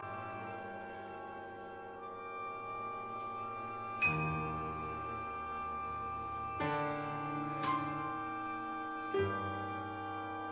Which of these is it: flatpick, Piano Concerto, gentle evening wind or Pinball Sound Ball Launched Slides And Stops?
Piano Concerto